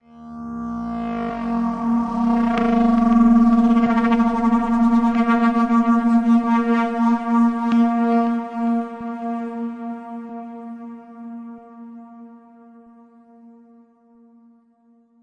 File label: approaching spindown synth